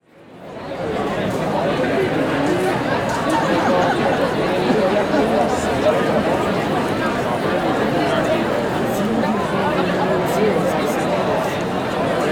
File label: Crowd Outdoor Large Sidewalk Cafe, Walla; Sound Ideas; Walla Series